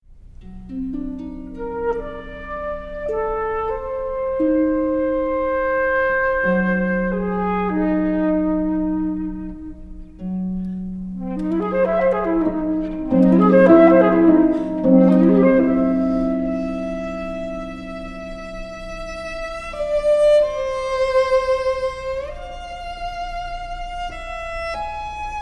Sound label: Sonata for Flute Viola and Harp Pastorale